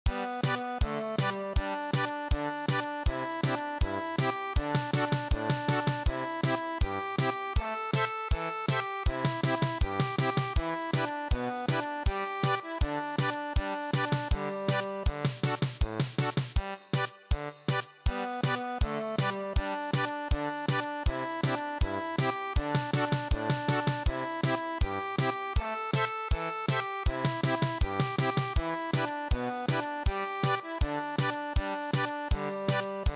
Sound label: German Polka, traditional; midi